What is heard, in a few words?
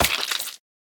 Banana Hit; smite; raijin